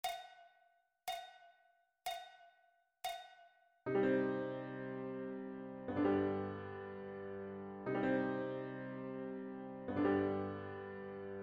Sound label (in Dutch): zonder klarinet